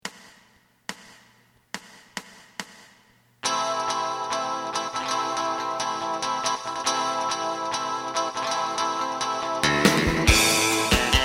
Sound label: bass